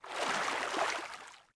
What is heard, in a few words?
water exit